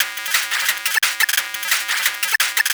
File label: PO Synth Am, 175 BPM; loops; CHIPSHOP DELUXE